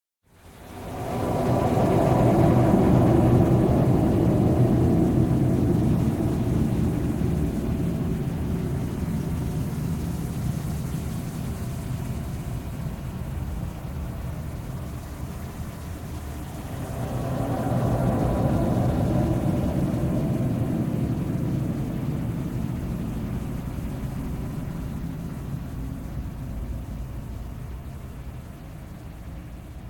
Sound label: Unsettling noises; SyrinScape; Waterdeep